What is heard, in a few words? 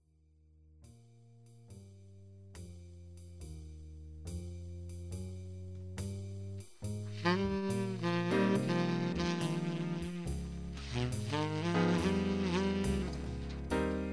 subtone, sax